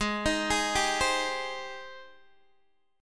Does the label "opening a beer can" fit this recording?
No